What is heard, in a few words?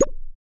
button